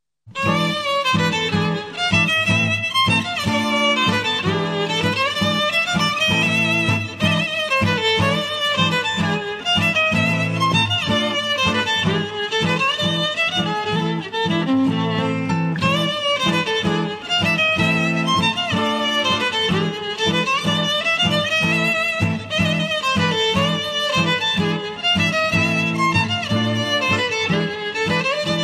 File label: dance; sharaban